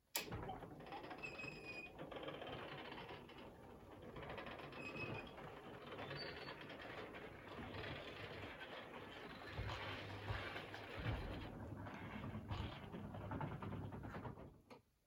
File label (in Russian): elrollet opusk